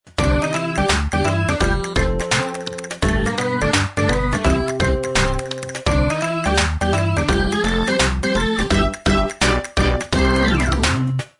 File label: Ingame music; Crowdy Farm Rush